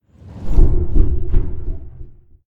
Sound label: Upper Elev Weights Jiggle